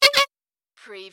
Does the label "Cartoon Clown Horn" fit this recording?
Yes